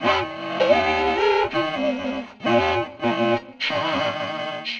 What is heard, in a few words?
broadcast, 100bpm; transx loops